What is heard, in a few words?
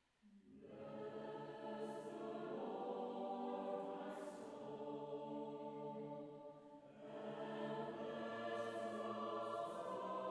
Taize SATB a capella